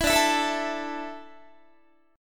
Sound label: D Suspended 2nd D, down, Guitar; strummed